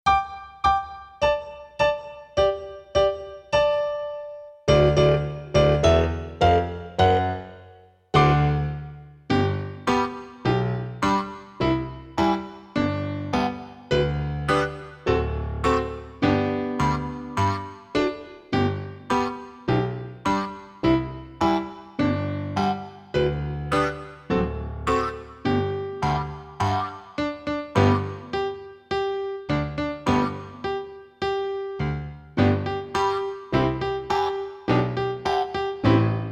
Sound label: Old MacDonald Had A Farm Fast Accompaniment